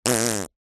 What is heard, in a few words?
human fart; zapsplat